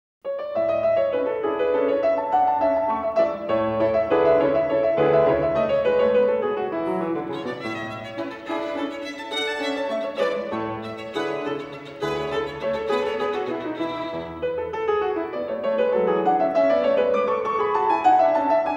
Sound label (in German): Violinsonate A-Dur - Variation VI, Allegro; Mozart; Chamber Music; Violin Sonatas